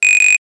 Buzzer; Nokia; Henry Daw; NOKIA GENERIC

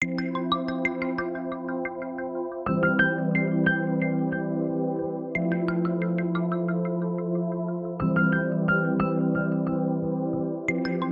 Dew rhyme, ringtones; Lenovo; A630

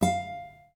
Classic Guitar

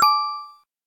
doremi 9

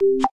long clicked; Samsung Galaxy S6; Galaxy Talkback

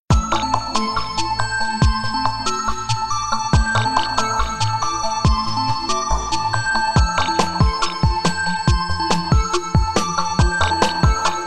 Dark, ringtones

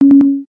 hold fail; SKY IM-A910S-VEGA-Iron-2

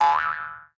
Spring, notifications; ZTE Grand-S-2

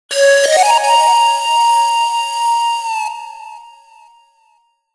Ring Flute; HTC Touch Diamond

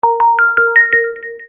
Drops, phone tone; PlusFourSix; Sania; Lars Edvall

